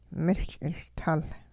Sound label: song at the Kick Dance, Hupa